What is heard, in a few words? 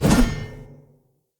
Player Sphere Polearm Whoosh Heavy; Weapons